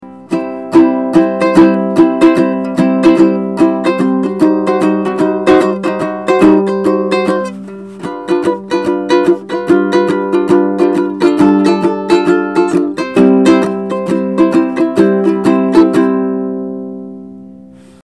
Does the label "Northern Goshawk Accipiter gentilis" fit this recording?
No